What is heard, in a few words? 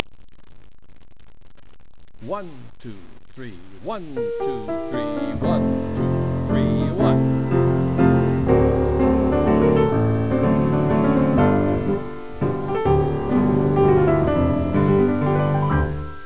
waltz